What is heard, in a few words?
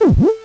EAT FRUIT